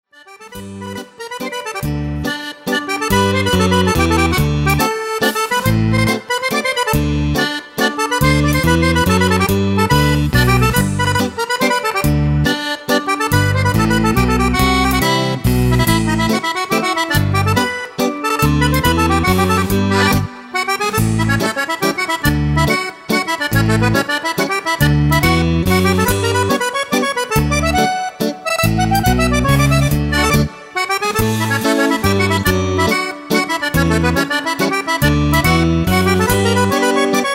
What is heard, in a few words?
romantica mazurka